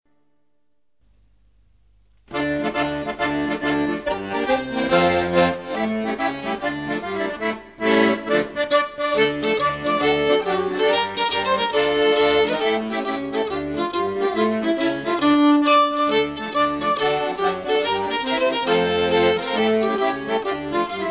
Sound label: Tarantella